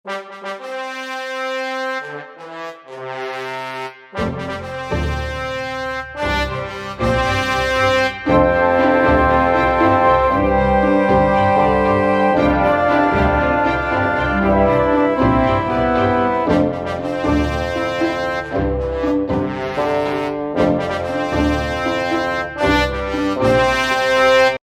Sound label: Theme Ringtone